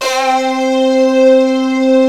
ZAP STRINGS, ENSONIQ VFX; Rubber Chicken Software Sample Bank

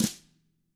DW BR FLAM, DW BRSNR; ILIO; Double Platinum Drums 2

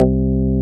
PLOP C2, BASSES

